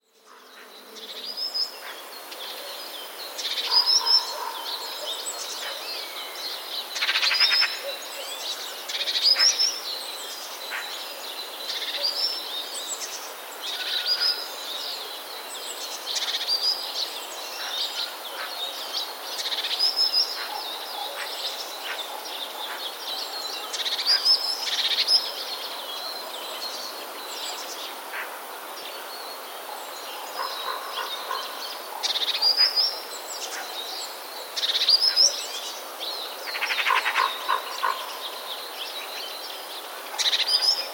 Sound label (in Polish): spiew; śpiew-nieśpiew